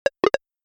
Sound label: horse run